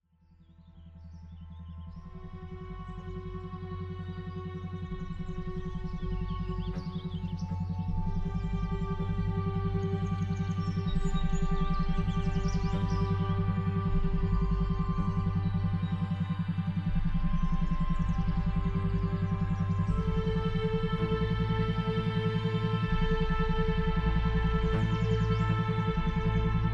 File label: MUSIC Deep Theta Meditation